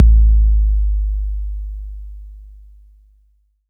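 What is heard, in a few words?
bass drum 808 Tape Decay F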